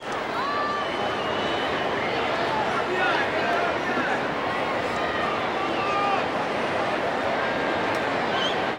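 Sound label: baseball crowd ambience chatter; Crowds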